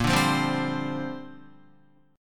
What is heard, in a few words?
Bb 6th Add 9th Bb Guitar; Bb6add9 strummed